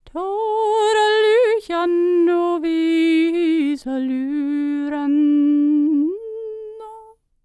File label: Nord, World Voices; ILIO; Vocal Planet VOL-5